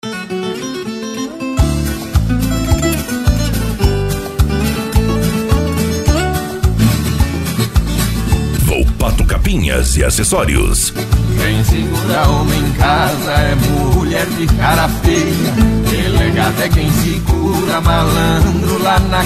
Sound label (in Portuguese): pagode de viola